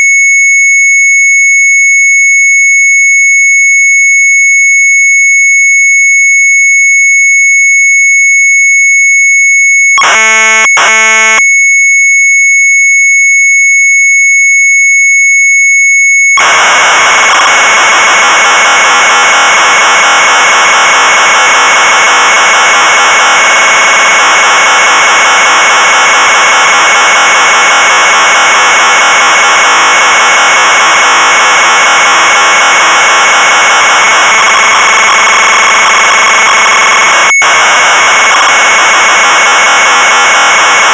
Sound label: MZ800 Tape; sharp; DOGGY'S FLIRT; retromelsarchive